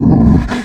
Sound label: pain